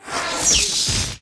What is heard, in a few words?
Die; PACHI; Mob